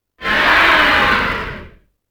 VOICE WA; UFCTapout1; XBOX

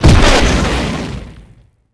laser Hit; impacts explos

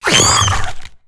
kinrath hit; Star Wars Knights Of The Old Republic I; XBOX

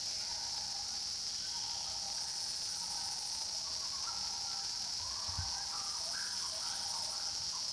amb swamp; naboo; Star Wars Obi-Wan; XBOX

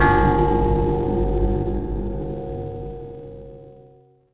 bell; The Elder Scrolls III Morrowind GOTY; XBOX

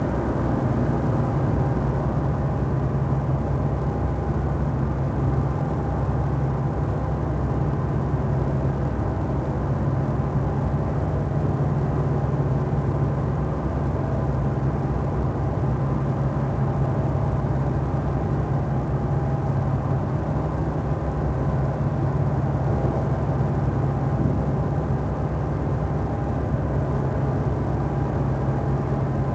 Docks loading area, noise; Max Payne; XBOX

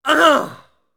get hit voice; UFC Tapout; XBOX

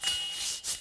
shift pole metal

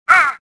choke; Star Wars Jedi Knight II Jedi Outcast; XBOX

